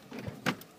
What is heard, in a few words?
Car Door Opening